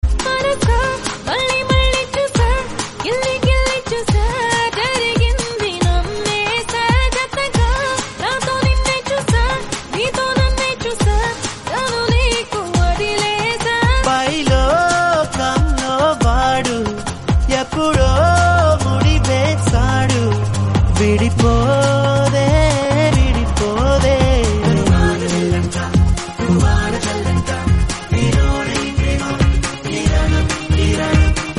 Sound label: love story instrumental telugu; naga chaitanya